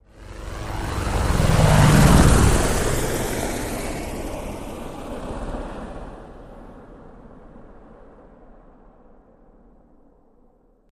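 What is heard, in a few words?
Apache Medium Fast By Left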